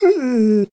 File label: Wolf Hit; The Battle for Wesnoth